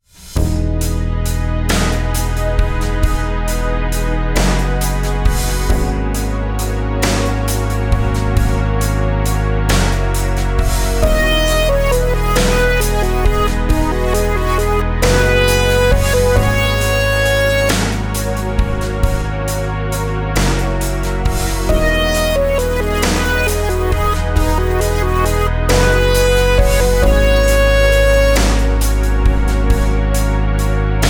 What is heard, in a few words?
rhythms compound 8s w16s